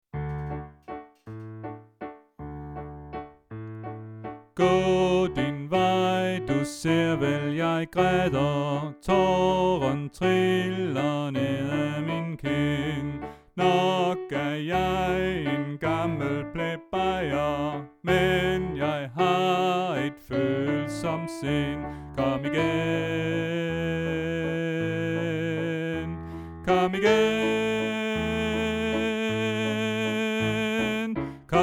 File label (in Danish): Sopran